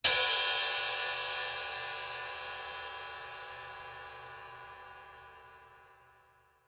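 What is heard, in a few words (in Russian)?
пинг, барабанные тарелки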